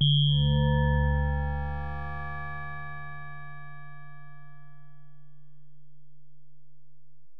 Bell, Alesis DM-PRO

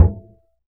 Upright Acoustic Bass; 1ST POSITION; Larry Seyer

